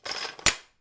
Sword Sheath

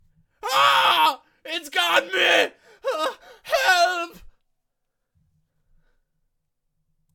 Desperate Scream

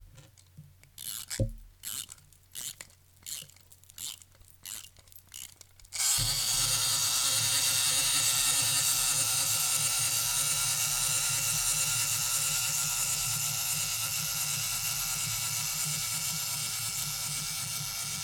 Clockwork toy

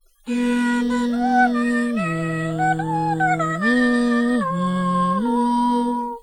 Singing Melody

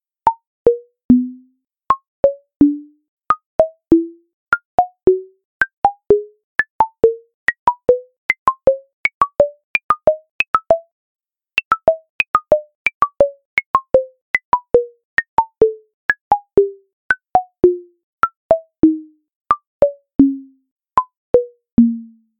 Xylophoneish

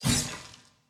Hit Swing Sword

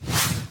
Swoosh

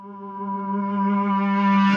Reverse Electric Guitar

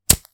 Lighter

